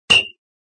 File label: hammer; Flying Metamorphosis Queen of SM Bar Fuate Co; android